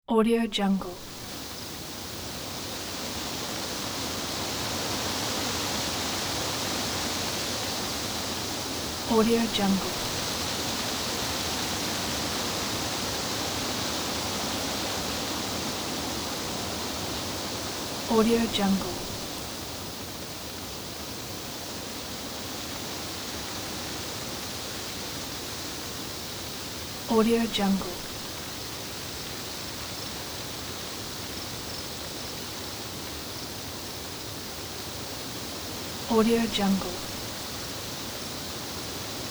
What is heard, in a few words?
leafy breeze